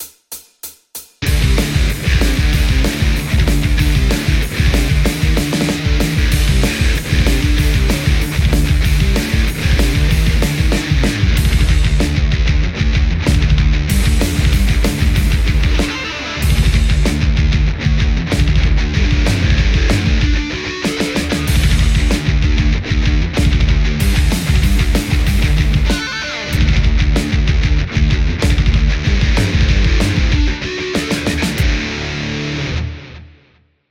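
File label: metal Stratocaster; scuffham; sgear2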